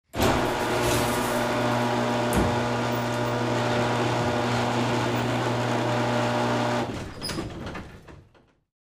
garage door open

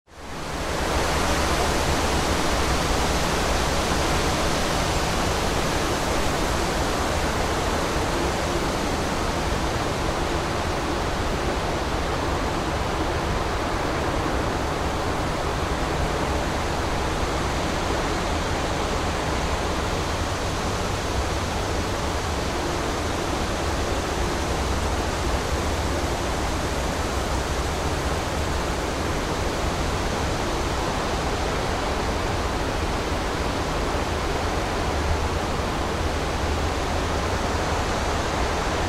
windy forest, ambience sounds

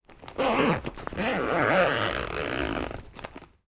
zipper bag

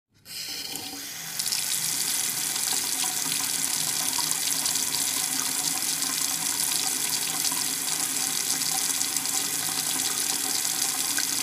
bathroom faucet

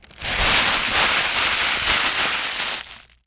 crumbling plastic bag

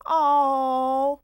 ooooh

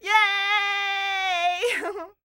yay

